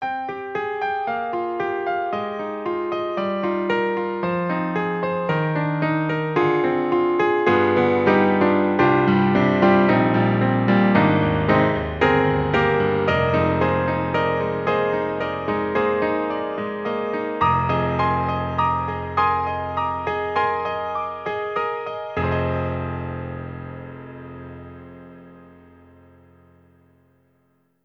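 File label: Sound Font, piano; Sound Blaster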